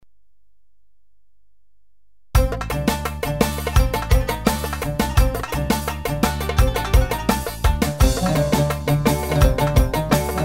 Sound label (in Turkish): pa80 ciftetelli deneme; sx3000; lesli